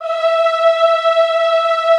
EHH E4, Classical Choir; Best Service

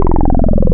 Filter FM Hi Q, wavetables; Animoog

